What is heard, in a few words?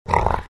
grunt, greevil; courier